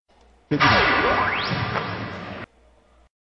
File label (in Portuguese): bolinha